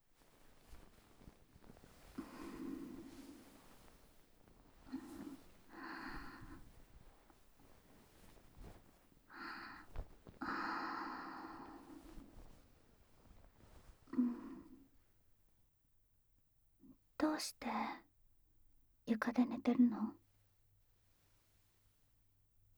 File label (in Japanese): クールお姉さんのドスケベ添い寝煽り＆耳舐め